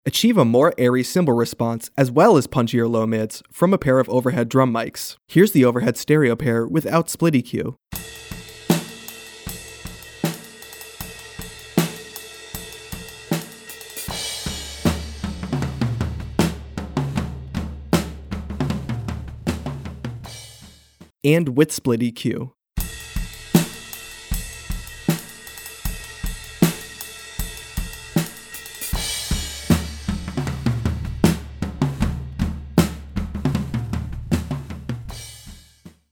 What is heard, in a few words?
Rebalance Drums; リバランス; ドラム; SplitEQ